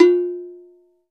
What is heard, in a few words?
hand bell lo